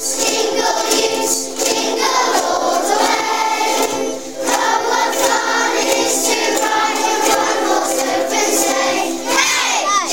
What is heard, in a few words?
jingle ukes